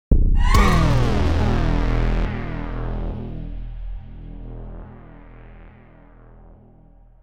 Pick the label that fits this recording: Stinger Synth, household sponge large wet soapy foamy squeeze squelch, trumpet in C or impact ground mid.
Stinger Synth